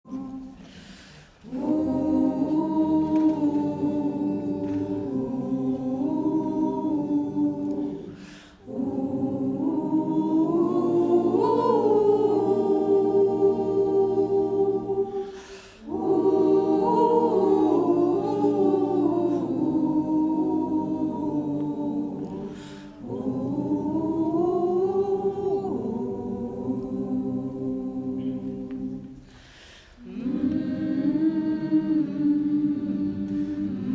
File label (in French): berceuse cosaque version choeur